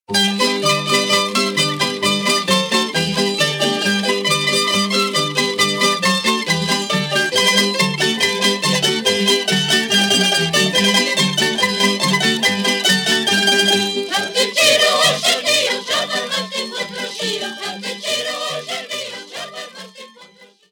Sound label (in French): Kolo - Croatie